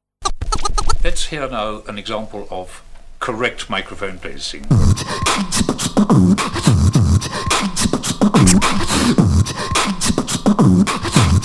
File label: drums